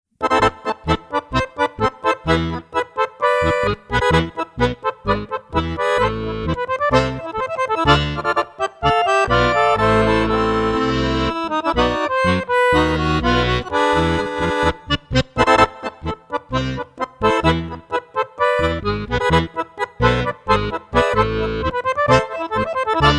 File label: Tango